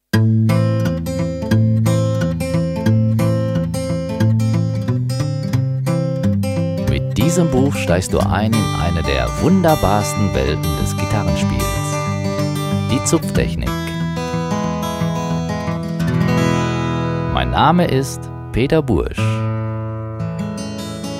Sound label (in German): zupfen